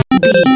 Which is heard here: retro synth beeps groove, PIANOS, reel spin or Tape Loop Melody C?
retro synth beeps groove